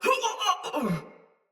Death Soldier Mace Agent Female; Battle; Sinecure Melee

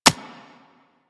Bullet Impact Generic; Warframe

